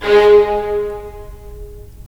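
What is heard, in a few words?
strings violins, left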